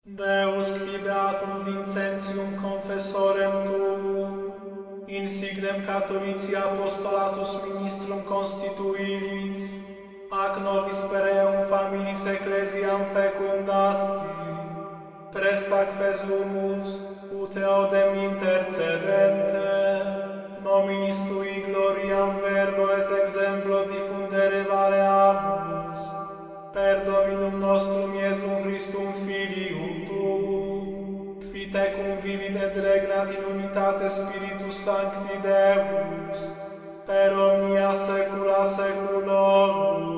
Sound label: Schola Gregoriana Oratio; Oratio de S. V. Pallotti